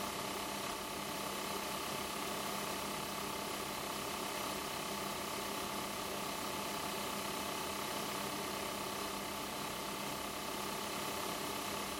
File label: 500 RPM